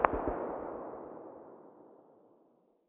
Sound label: hmg very far distance, 12.7x99mm; tacz-tweak-pack; taczmechanics